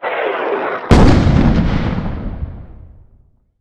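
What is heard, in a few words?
bomb artillery; bombs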